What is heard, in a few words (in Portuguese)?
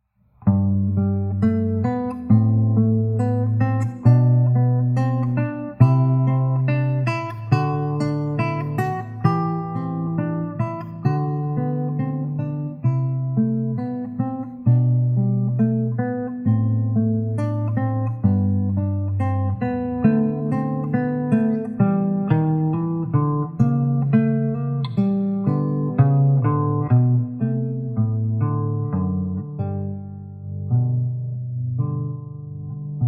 violão, lição sol maior; Sor; Coste